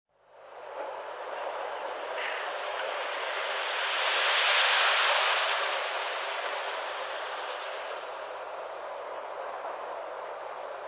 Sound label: Aythya marila; Sakhalin; Kuril; sea; priroda sakhalina i kuril